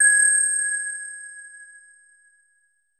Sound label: BELLS G#5, synth waves; Music Store Sound Service; Einstein